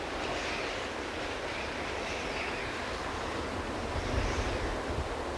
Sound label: wind; Mafie 1